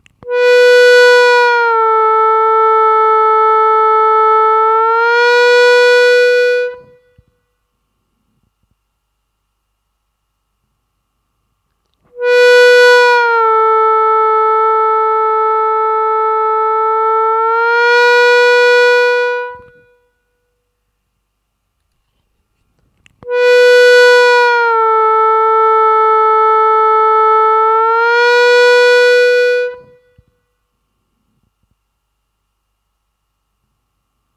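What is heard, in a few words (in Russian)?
плавно пауза, звуковой файл для отработки бенда -3» (в паузах «ищите» свой бенд), губной гармошки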